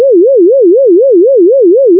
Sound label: string